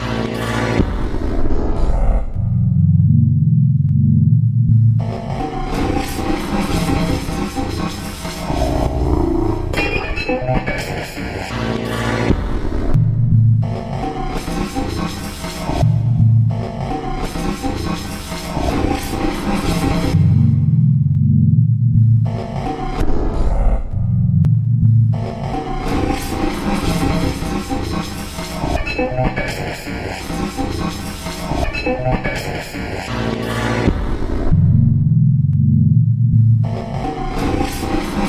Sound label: electric toy